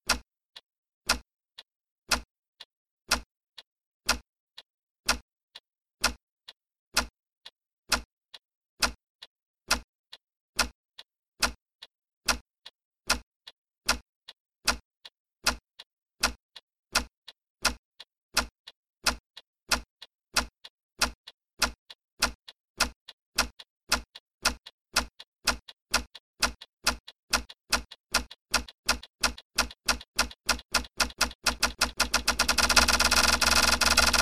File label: wall clock ticking faster